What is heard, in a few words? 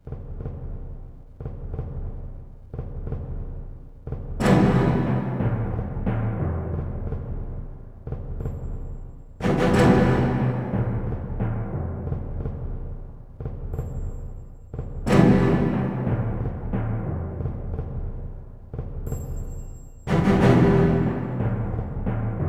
music arena level; TyTheTasmanianTiger3; XBOX